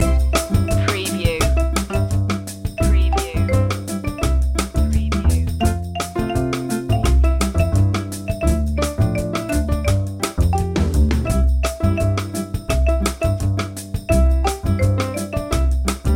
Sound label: jingle bossa bells loop